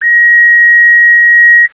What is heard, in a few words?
stall